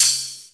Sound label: open high hat d key